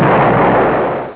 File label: expl; submarinegame